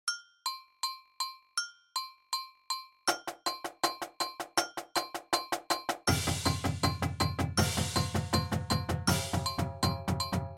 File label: 8s 160bpm, drumline